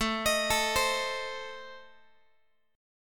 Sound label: A Diminished, down Guitar; Adim arpeggiated; JGuitar